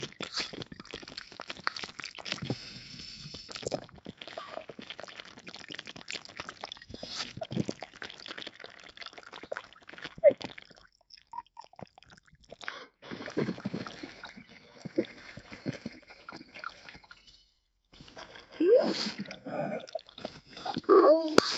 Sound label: an animal whines and eats